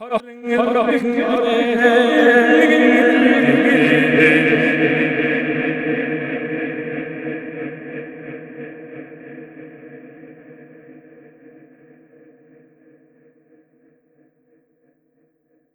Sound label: MONGOL V FX, Asian Traditions